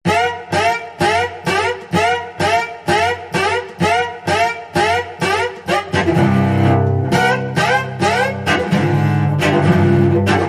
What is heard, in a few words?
Cello Danger